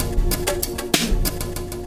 VFX2 Loop 128 BPM

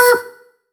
Synths B; vengeance essential club sounds-3

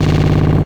FX Alarm; Vengeance Samples

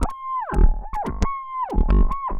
Roland F Line, Roland S100

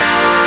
Synth Sound; Vengeance Samples; VEE Synth Shots

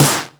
snares; Vengeance Samples

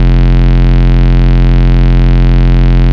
Root F, Bass

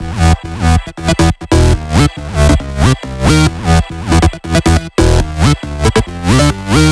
NuTrance Melody 1, 138.5 BPM; Vengeance Samples

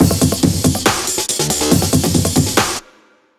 Drumloop; Ghosthack

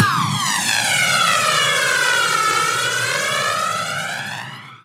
Downsweeps

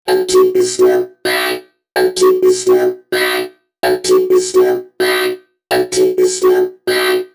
Vocoder Loops 128BPM; Vengeance Samples; VVE1 Vocal Loops

